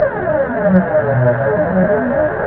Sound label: recharge; wipeout